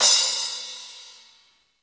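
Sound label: processed cymbal crash, drums; Crypton - DrumBase5000; PROC.DRUMS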